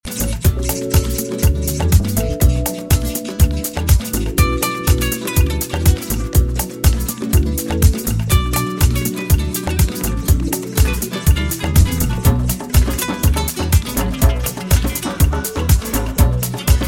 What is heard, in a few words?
Tribal Dance